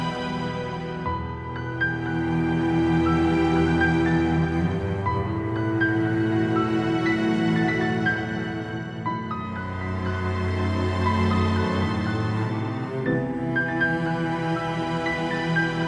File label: Dark Orchestral Music, Dark Classical